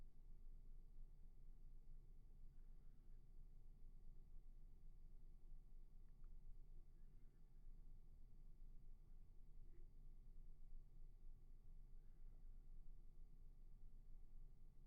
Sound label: roomtone